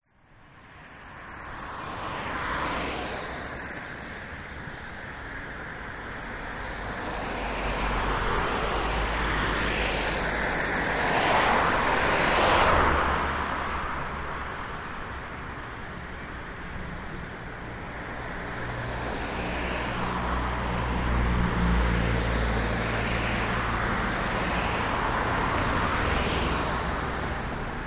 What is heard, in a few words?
vehicles traffic busy town main road 60kph central reservation binaural; zapsplat